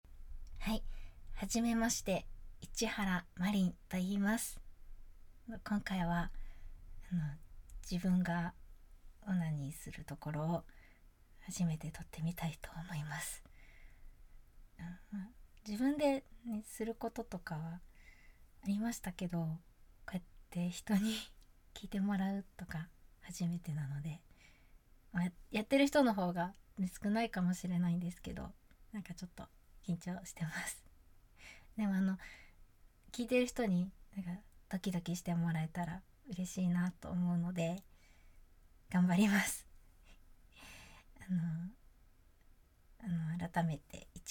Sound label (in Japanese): 初 ガチ 実演 オナニー; THE FIRST ORGASM